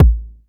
PERC; ZERO-G; Datafile

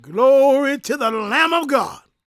GOSP MALE, Vocal Planet Gospel

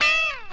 GTR

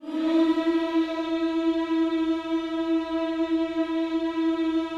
HMMS E 4, Vocal Planet Gospel; Spectrasonics; Vocal Planet Vol.1 Gospel; akai

